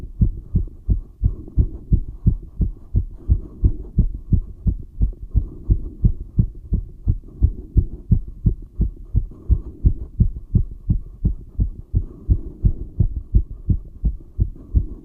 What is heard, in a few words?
run heart, halloween